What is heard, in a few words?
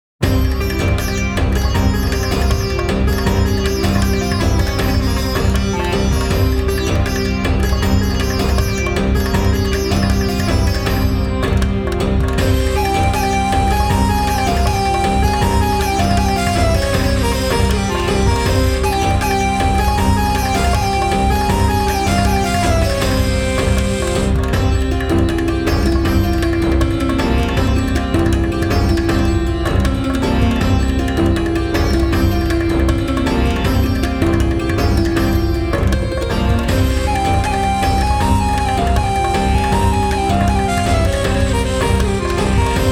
Raga; Rampage